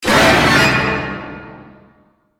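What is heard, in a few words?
Sting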